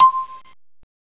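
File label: MARIMBA C1; Alone In The Dark Demo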